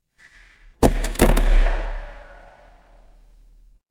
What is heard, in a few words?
office stapler